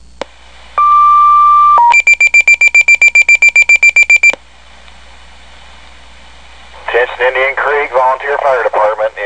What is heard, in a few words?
Fire Department Paging Tones; Indian Creek